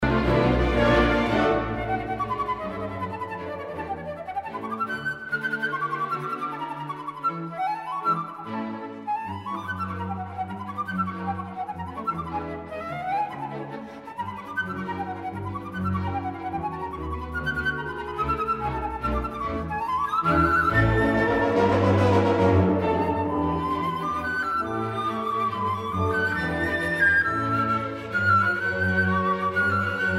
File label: Flute Concerto in D Major Finale Moderato; Carl Reinecke; Op.283; III